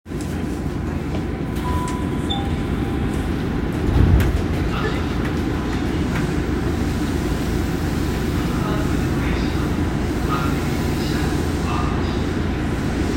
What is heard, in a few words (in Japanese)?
副都心線池袋北